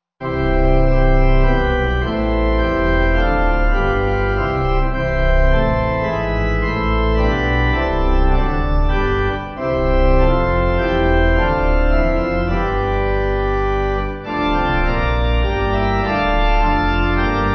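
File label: Pipe Organ, Music Backing Files for Churches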